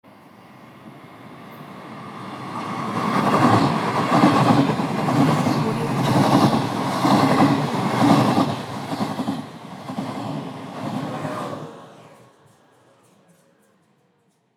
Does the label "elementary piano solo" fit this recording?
No